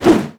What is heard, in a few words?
attack whoosh, zombie; creatures